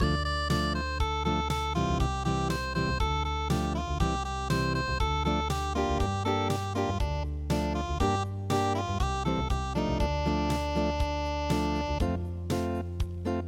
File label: miyasan unpluged